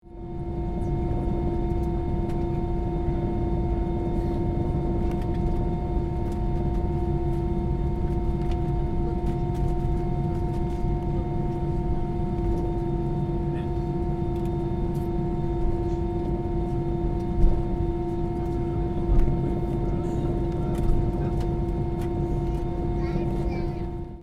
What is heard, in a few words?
Airplane ambience inside preparing to take off